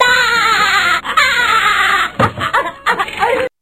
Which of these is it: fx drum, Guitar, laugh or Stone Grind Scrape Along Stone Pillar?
laugh